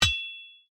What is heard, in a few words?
Sword Clang; soundscrate